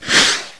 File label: whoosh